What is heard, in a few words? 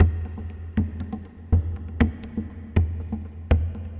PROCESSED DJEMBE; Sonic Foundry (Sony Creative Software); Vir Unis Infusion